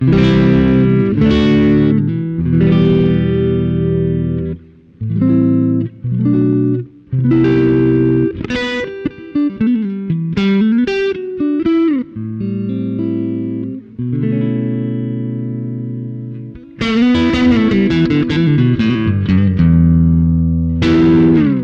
strato tweed hot center; kaula; gt2

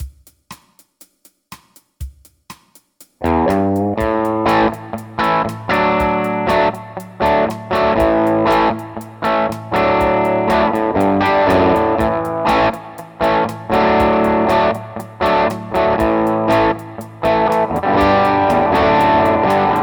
twin 335

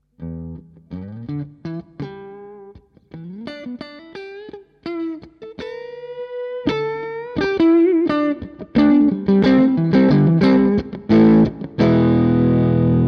strat neck more gain; ad30tc

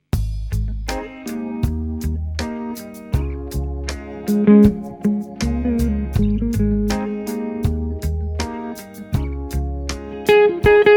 reggae; peavey

